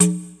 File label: perc, Percussion; Trance Explosion Vol1